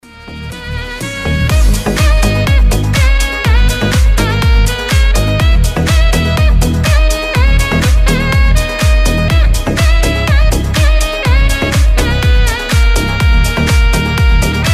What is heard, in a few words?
saxy, саксофон